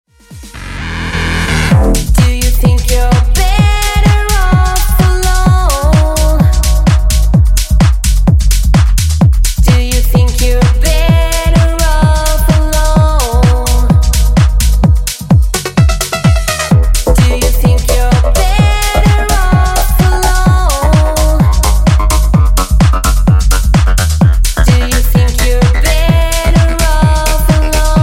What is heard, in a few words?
Hype Toneplay 128Bpm